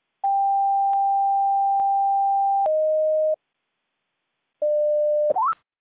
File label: standard recall